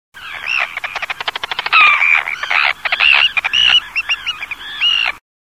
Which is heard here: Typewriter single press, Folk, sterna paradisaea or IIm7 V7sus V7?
sterna paradisaea